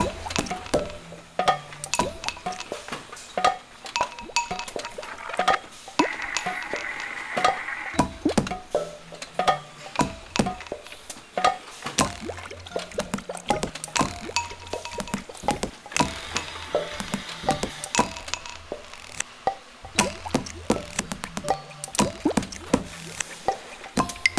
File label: concrete water, marseille; Jean Christophe Quilez